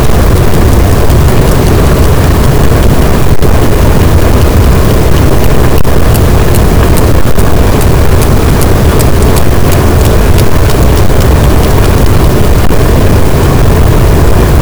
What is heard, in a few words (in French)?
cachalot, Physeter Macrocephalus, gaussian noise